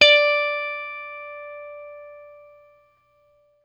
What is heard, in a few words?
BLUE POP, Guitar & Bass